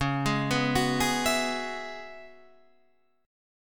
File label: Db Minor 11th Db down Guitar Standard; Dbm11 arpeggiated; JGuitar